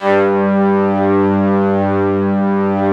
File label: ST ORG, STRINGORGAN, Yamaha VP-1